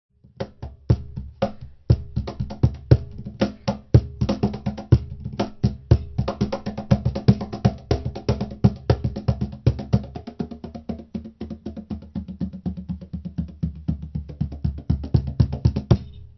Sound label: Cajon